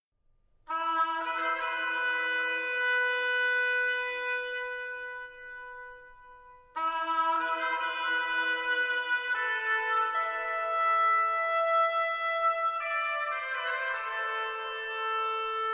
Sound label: Fanfare (Treble shawm)